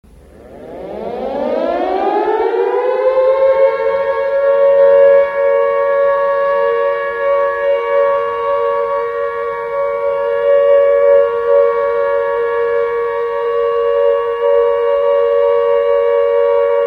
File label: all clear, air raid sirens; carter